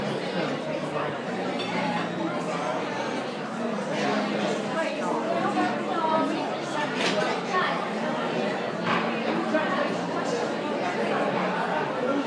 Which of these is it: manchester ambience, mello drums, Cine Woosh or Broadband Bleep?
manchester ambience